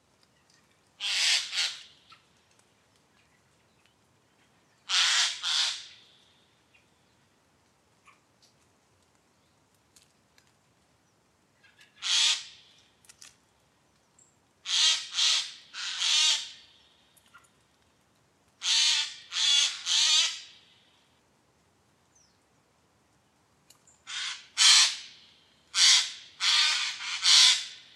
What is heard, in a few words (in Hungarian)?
szajko Garrulus glandarius